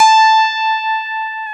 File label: FM Rhodes; Club-50 - Foundations Roland